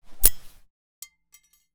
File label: Nade Over Cook, Grenade; zps; Weapons; Explosives